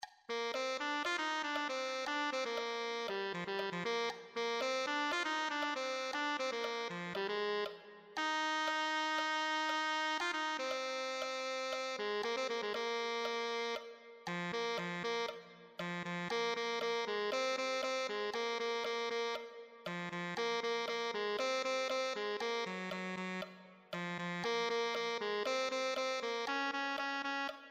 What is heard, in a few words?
tenor saxophone